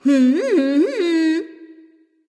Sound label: tongue; smite; aphrodite